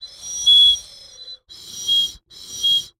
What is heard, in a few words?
Birdwhistle, clay, painted, dry, airy, wispy, triple, swells, slow, pulse; Eiravaein Works; Vaeyan IV